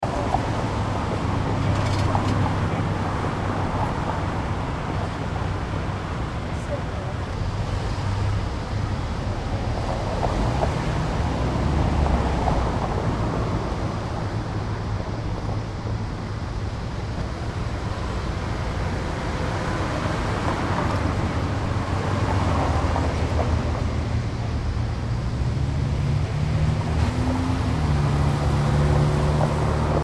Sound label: atmo arizona prescott town square traffic